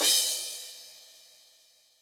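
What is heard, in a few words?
Fast Crash, Yamaha DTXpress Cymbal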